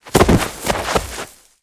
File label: horse body fall begin; Mount&Blade Warband